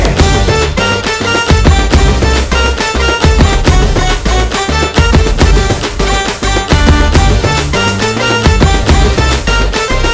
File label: Instrumental